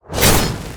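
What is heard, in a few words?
machete fire, enemy sound impact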